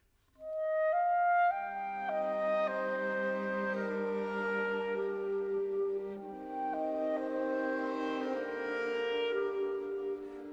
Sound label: Quintet